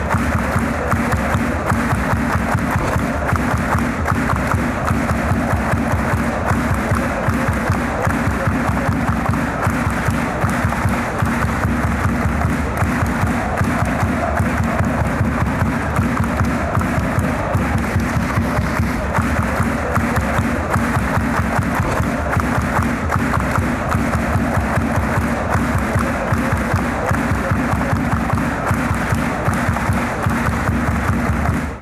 clapping, chants

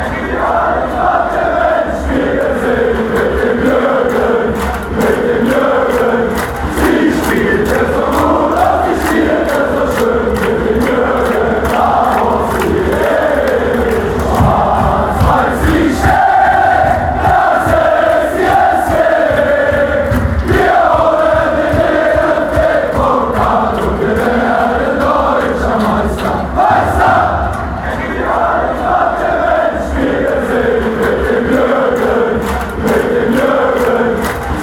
chant, fanchants; eintracht frankfurt

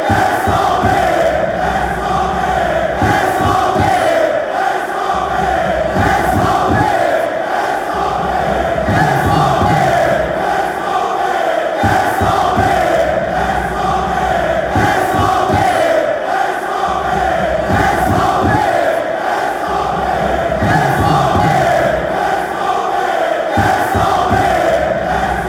answer fan chants; svb

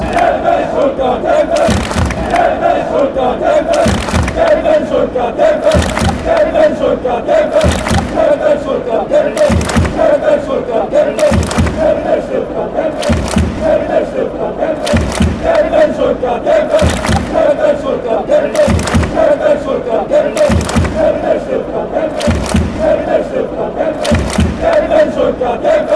fight, fanchants; stuttgart

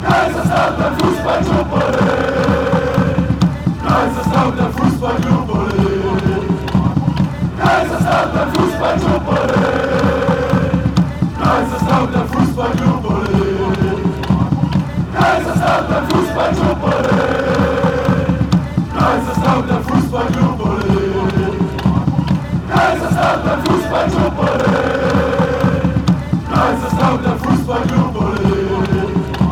fussball club, fanchants

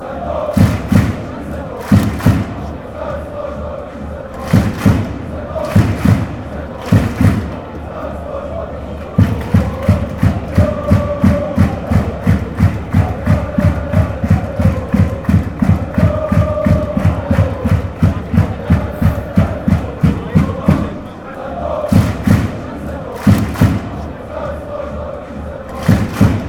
goal, fanchants

